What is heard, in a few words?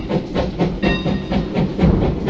train bell